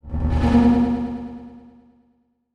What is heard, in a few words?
Transition Delay Medium; Production Element Title Transition